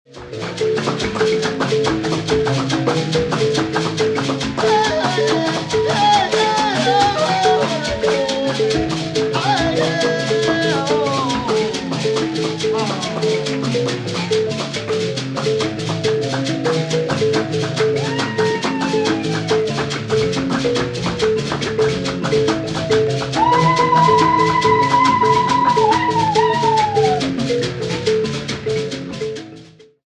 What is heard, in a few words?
mbira